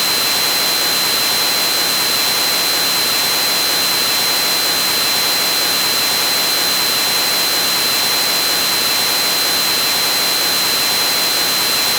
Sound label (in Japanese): ノイズ ピー音入り 高め